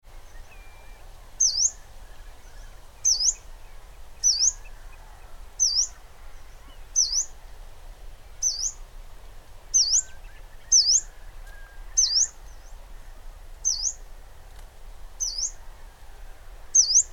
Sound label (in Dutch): bladkoning, trekroepjes; tijdens de vogeltrek